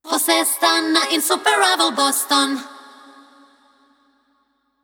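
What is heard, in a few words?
Radio Jingle, 90bpm, Db minor, Acapella; Radio Insuperavel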